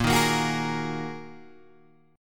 Bb 7th Suspended 4th Sharp 5th Bb Guitar Open G

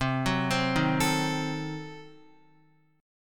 C Diminished 7th C, Guitar 4F,4A,5C,5G,5C,6E; arpeggiated; JGuitar

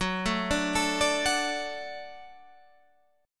F sharp Minor Sharp 5th F sharp Guitar Standard; arpeggiated; JGuitar

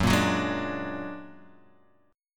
Bb Augmented 7th Gb

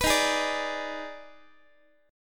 Eb Major 7th Suspended 4th Sharp 5th Eb, Guitar; EbM7sus4#5; strummed

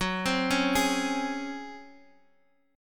C sharp Major 7th Suspended 4th F sharp

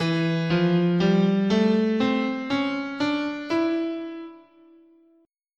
E Symmetrical, guitar, scale

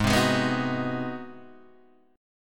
G sharp 7th Sharp 9th, Guitar, Open G

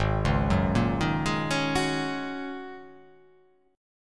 Ab 7th Suspended 2nd Suspended 4th Ab down; Ab7sus2sus4 arpeggiated

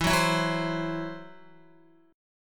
E Suspended 2nd Sharp 5th, Guitar, Esus2#5 chord; strummed; x,7,6,9,6,8

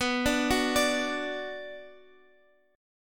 B Minor, Guitar, Standard; arpeggiated; capo 3; 19,17,16,19,x,x

